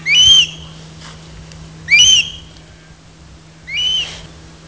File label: call, Stone curlew, The bird uttered this call in flight; probably when it was frightened by the view of the recordist; Burhinus oedicnemus